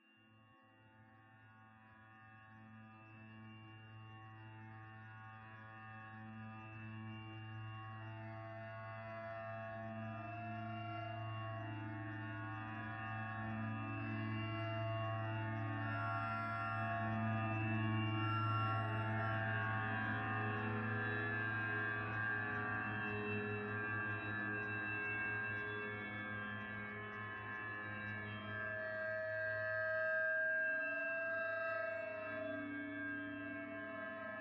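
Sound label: Audio Drone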